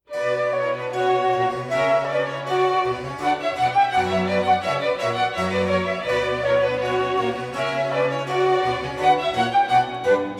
Suite for Orchestra No 2 in B Minor for Flute and Strings; Johann Sebastian Bach; BWV 1067; II Ronde